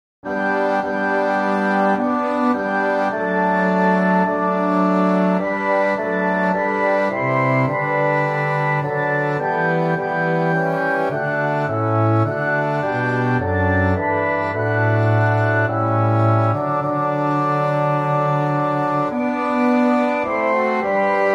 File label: Orchestra; Hymns